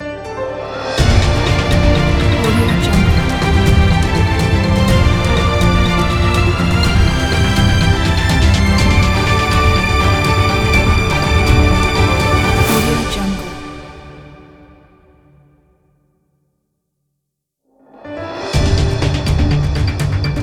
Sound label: breaking news